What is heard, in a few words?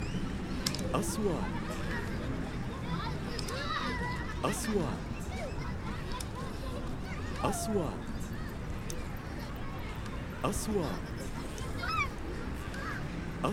Playing on the beach